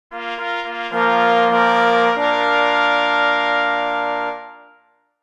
Fanfara; St. Rajba; Fanfara II; powitalne fanfary stanislawa rajby

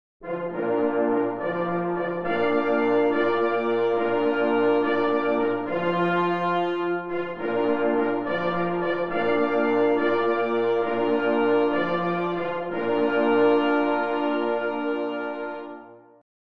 Hejnal na 2 plesy i 2 parforsy; Kola Lowieckiego GLUSZEC w Kartuzach

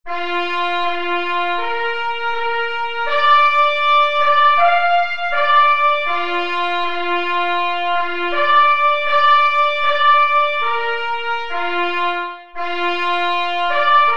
Zalobna piesn mysliwska solo; J. Rentflejsz

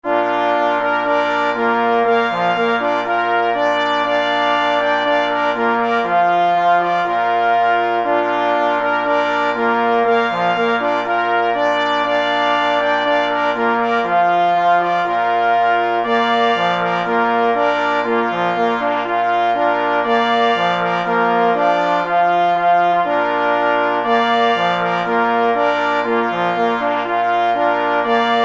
Fanfara, 2 plessy i 2 parforsy; Fanfara Psiarzy Galicji; pies w muzyce lowieckiej dodatek

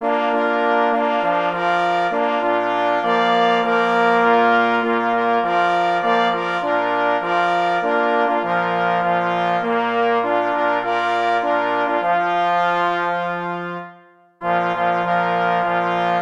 Pozegnanie na 2 plesy i 2 parforsy; Cz. Trybus

